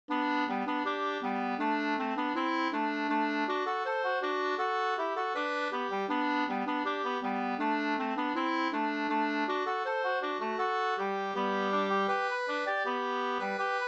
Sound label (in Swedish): folkdans; Hiaderia Svsangl35 2st